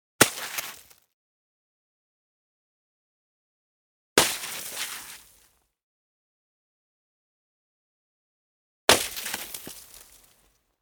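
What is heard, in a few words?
snowball hit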